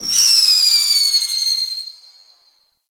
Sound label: Spam Projectile Whizz; Warframe; Teralyst; Ropalolyst; Abilities